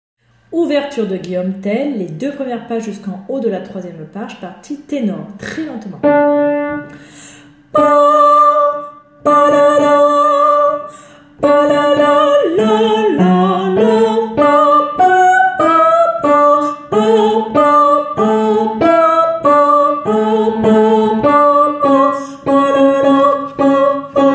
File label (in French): Ténor; G.Tell